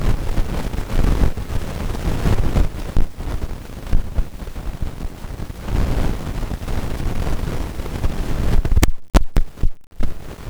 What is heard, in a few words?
amp